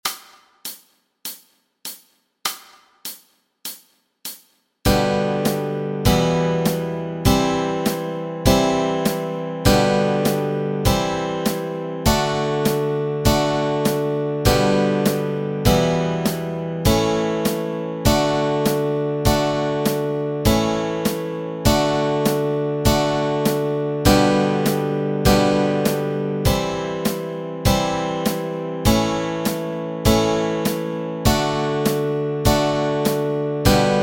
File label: chords, Easy Guitar and Ukulele